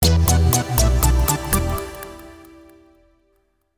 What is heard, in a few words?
sound logo